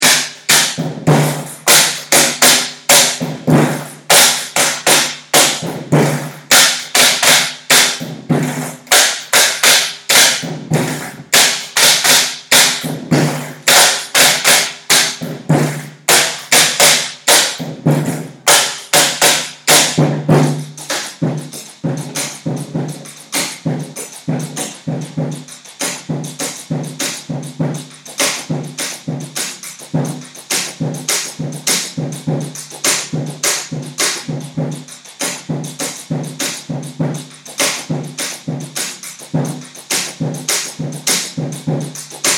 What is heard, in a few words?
Beats Sample; SICKLE C9